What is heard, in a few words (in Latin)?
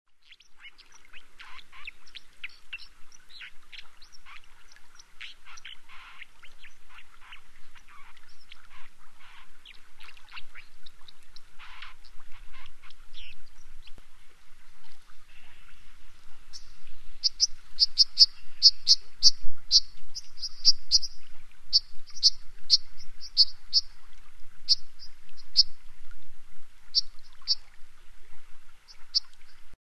cinclus cinclus; Przyroda ptaki i zwierzęta